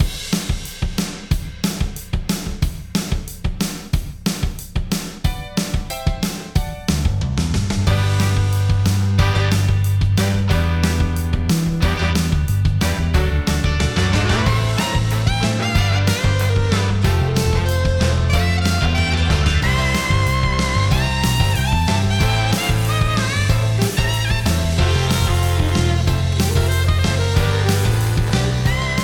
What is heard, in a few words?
SNARE; STAR NURSERY MUSIC